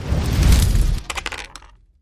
bonus click dice; The Golden City

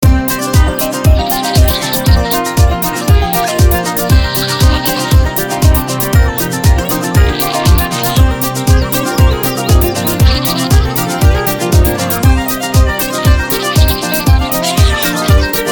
free spin melody; Magic Forest

